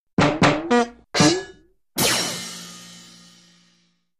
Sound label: Comic Hits zaps, boings